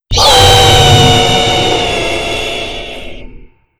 wing call; voidwing

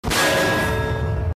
Clang Sound Effect; Berserk

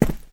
boots concrete

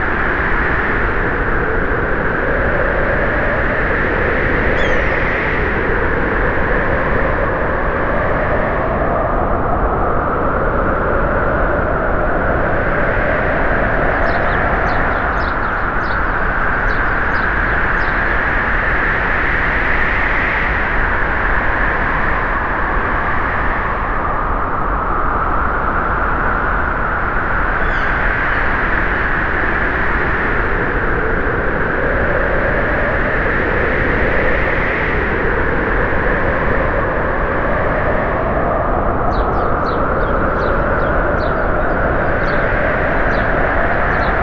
sky, ambiance

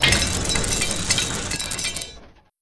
turret death

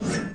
ax heavy; insurrectionist

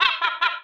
bird caw

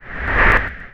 wind spin; voidwing

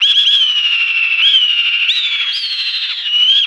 wing death; voidwing

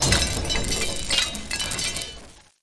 turret death

